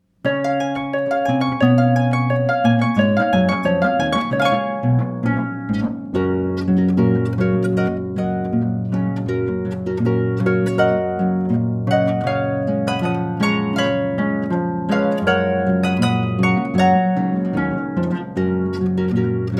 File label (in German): harfenmarsch